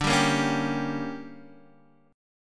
D Major 7th Suspended 4th Sharp 5th, Guitar Standard; DM7sus4#5; strummed; JGuitar